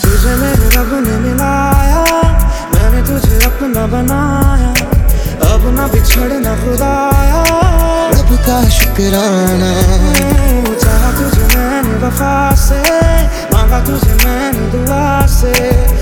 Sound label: Romantic Ringtone